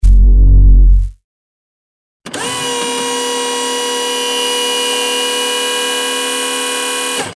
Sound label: signal; mujik